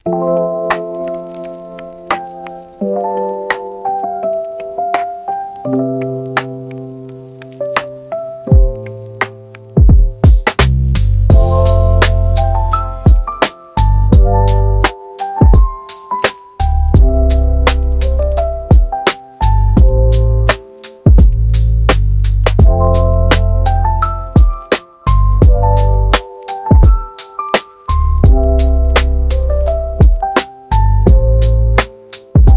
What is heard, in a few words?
Podcast Beat